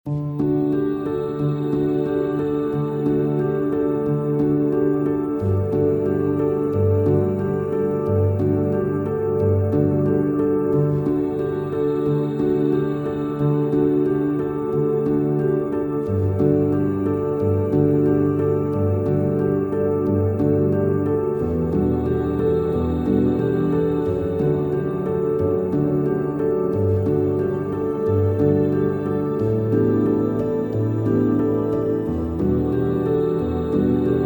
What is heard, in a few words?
Chilled Ambient